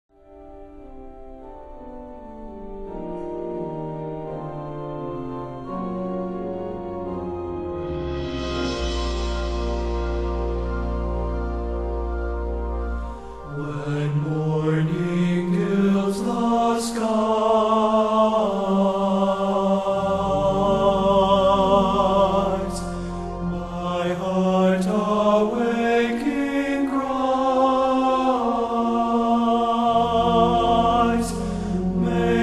Chorale With Orchestra, When Morning Guilds The Skies